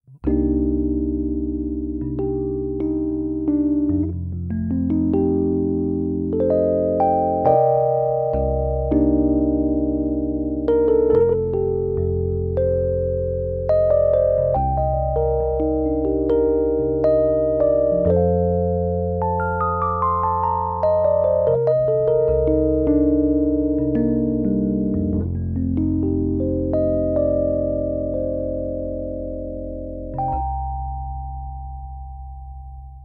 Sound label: sparkle, fender rhodes sparkletop